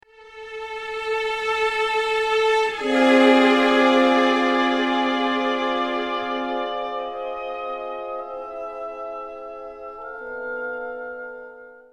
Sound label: music tristan tone